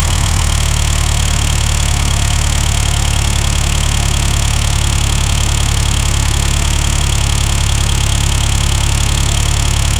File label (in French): son du moteur banshee 2t, atv